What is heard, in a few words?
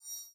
hover